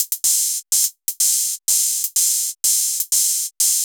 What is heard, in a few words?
drum loop eight hat 125, Classic Chicago House; Sample Magic; Drum Loops